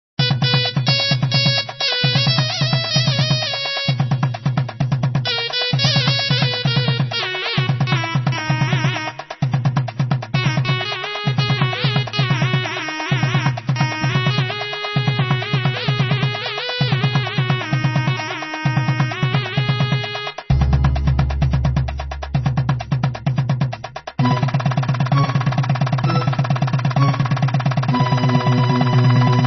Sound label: Tamil Karaoke